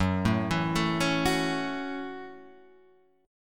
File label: F sharp Minor 7th F sharp; F#m7 Chord {2 0 2 2 2 2} arpeggiated